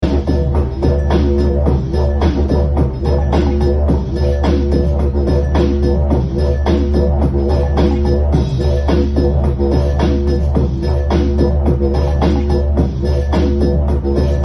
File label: DidgeriDoo; Droid